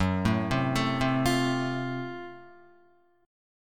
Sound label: F sharp Minor Major 7th Flat 5th, Guitar; arpeggiated